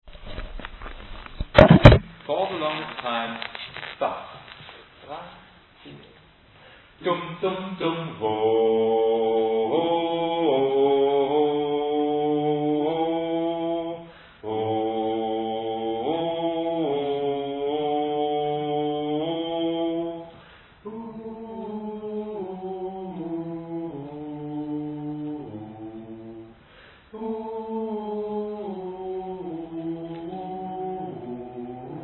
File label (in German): Bass